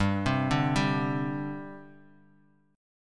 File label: G Suspended 4th; Gsus4 arpeggiated; JGuitar